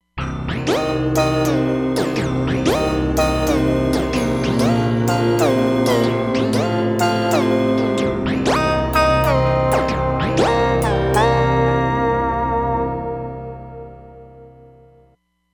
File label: Wired Synth, Roland Fantom XR